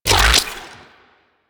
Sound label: Shotgun Beam Retract; Weapons; Revenant; Warframe; Tenno